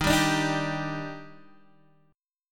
Eb Major 7th, Guitar DADGAD

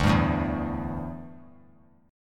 D Suspended 2nd Suspended 4th D down; strummed

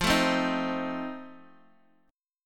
F 7th F down Guitar Open D

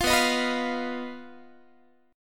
B Major Flat 5th B Guitar; strummed; 20,19,18,21,21,x

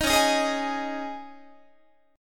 C sharp Suspended 2nd, C sharp, Guitar; C#sus2; strummed; JGuitar

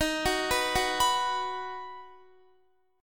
Eb Minor Sharp 5th Guitar; Ebm#5 arpeggiated

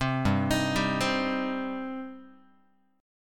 G Suspended 4th Sharp 5th, Guitar; arpeggiated; 3,3,6,5,4,x; 4A,4E,5A,5D,5G#,6C#

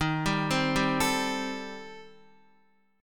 D sharp 6th Flat 5th, D sharp, down, Guitar; arpeggiated; JGuitar

